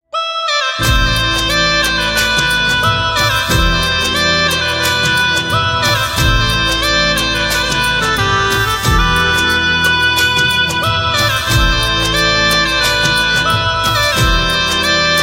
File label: Pianica